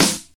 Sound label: Noisy Steel Snare Drum Sample G Key, snare drum sample tuned to the G no; snare drum samples